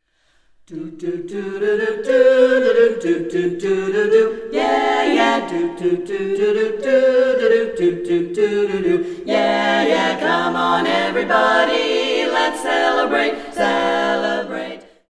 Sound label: Birthday Song, a cappella group